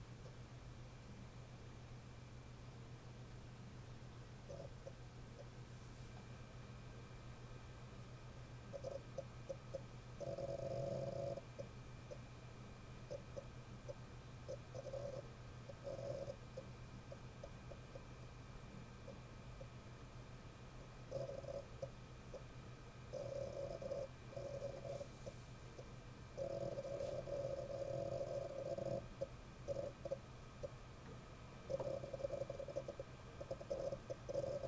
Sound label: ionega storcenter ix2 200 noise level